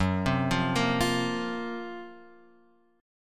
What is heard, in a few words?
Gb Major 7th Flat 5th Guitar Open F; GbM7b5 arpeggiated; JGuitar